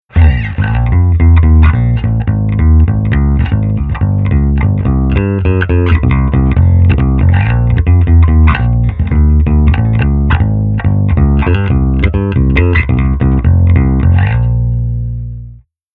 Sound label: Bass Amp Sim Cabi On A